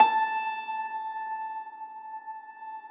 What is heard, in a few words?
Thumb Tack Piano ff +ped, A3; Best Service ProSamples vol.53 - Classical Prepared Pianos; Total Piano